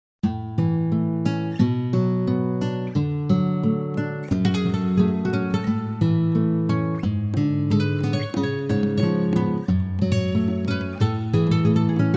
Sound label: ACOUSTICO